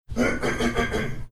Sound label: clearing his throat